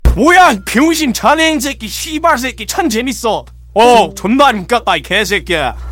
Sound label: scout laugh long